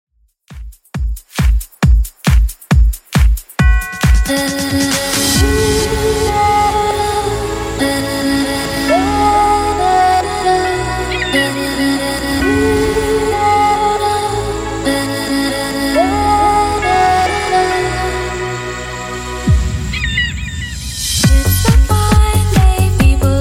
90s Redrum 136bpm

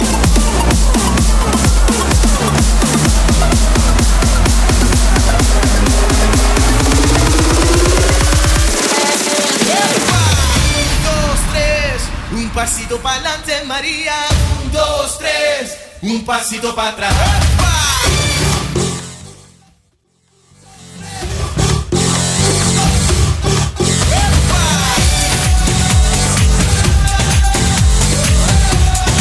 90s Bootleg Big Room 128 Bpm; Mainstream VIP

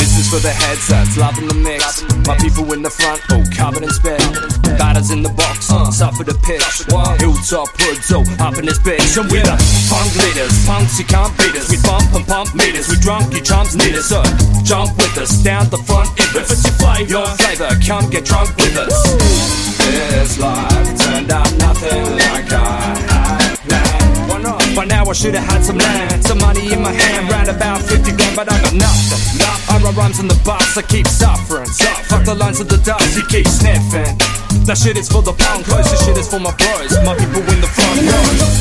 100bpm Abm

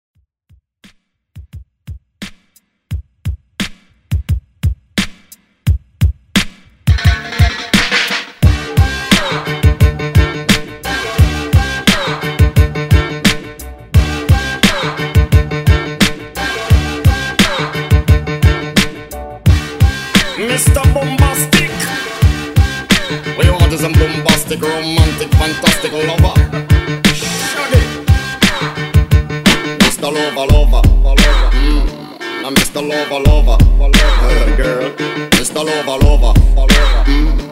Reggaeton

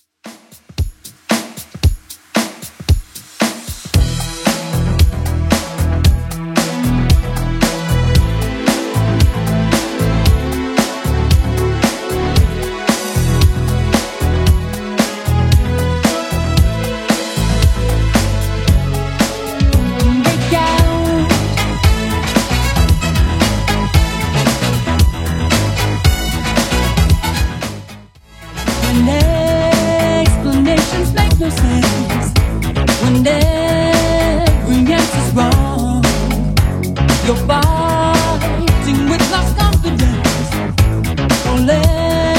80s Redrum 114bpm

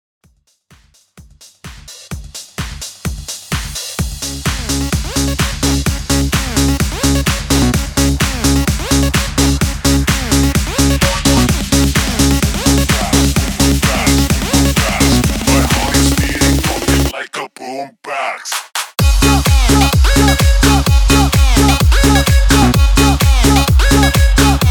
REDRUM, 128 BPM

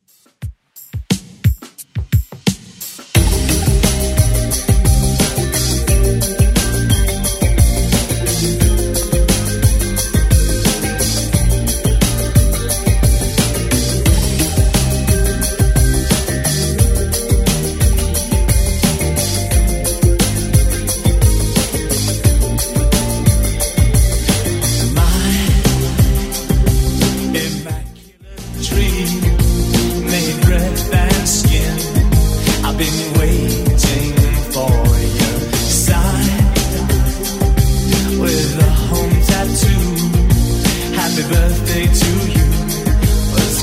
80s Redrum 88bpm

